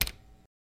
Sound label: Candy Machine Push B, buttons